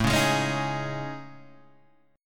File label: A Major Sharp 11th, Guitar, Half Step Down; AM#11